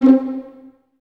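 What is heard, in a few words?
violins C4 pizzicato, String Ensembles; Miroslav Vitous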